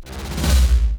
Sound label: Break Mono Jolt